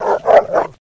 Rottweiler Vicious

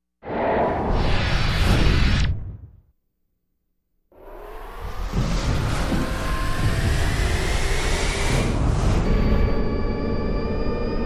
Arcade, video

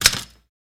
Typewriter Manual; typewriters

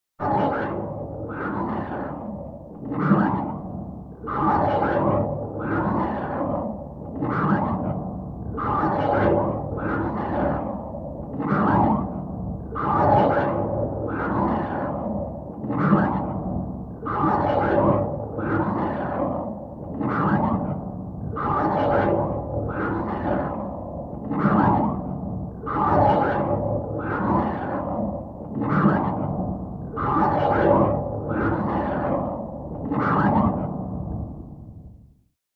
Low Frequency Synth T, synth tones

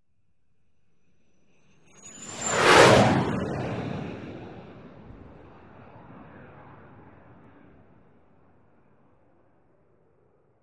Lear Jet By Short Tail, jet by

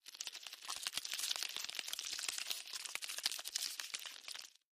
Bug Movement; bugs

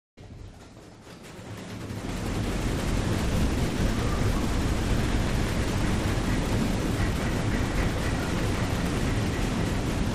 Crowd 100 Int Huge; medium int

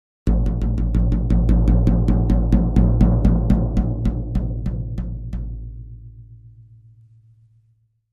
Drums Toms C Low Slw Do

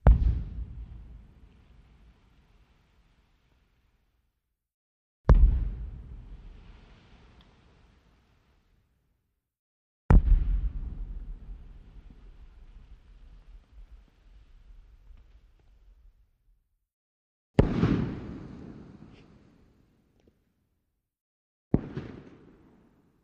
Underwater Explo Big

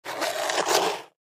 shovel snow

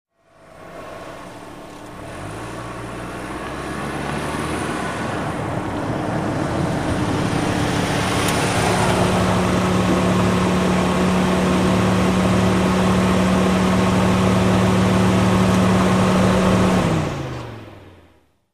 Sedan Acc Park Off

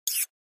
Flash Gear HF Elect Ri, flash gear

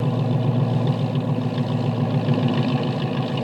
Mustang Short Idle

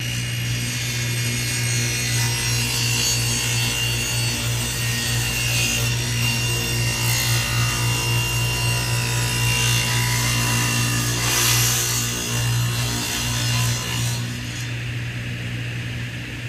Table Saw Cut Wood Of

